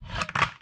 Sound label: bazooka reload gun cock; Blind Drive